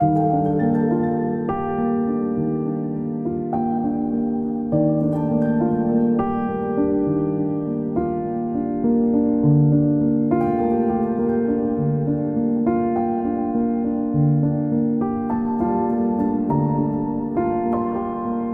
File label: Chilled; Library Chilled; Audio Animals